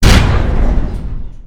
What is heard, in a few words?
pontoon impact hard, airboat; hl2